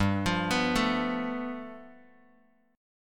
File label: G 9th, Bass Standard; G9 arpeggiated